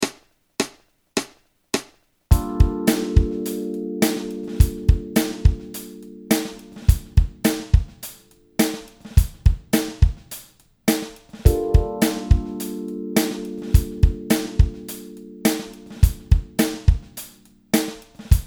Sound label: slap groove, bass playalongs